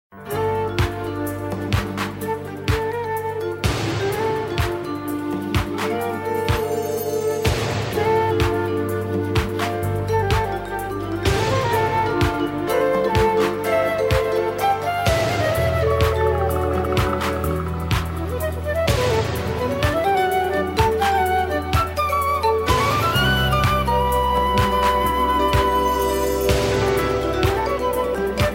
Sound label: jazz remix